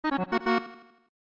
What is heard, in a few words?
Organ Song; Live-a-Live